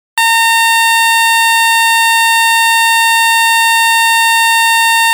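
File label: Oscillator Pulse 25pw 880hz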